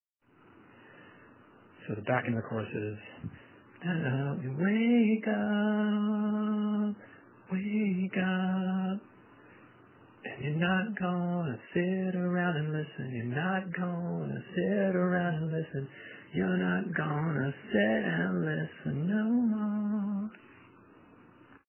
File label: phone melody